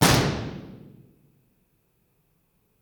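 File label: impulse response stereo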